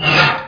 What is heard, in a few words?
bump scrape; tanarus